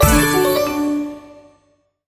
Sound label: dice win; Racetrack Riches; megaboard